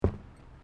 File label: Footsteps Boots Bridge Wooden Step; Footstep Foley; Footsteps (Sound Effects:Human)